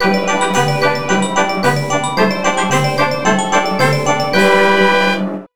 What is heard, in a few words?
Boss Beaten; galactic taz ball 2010 nds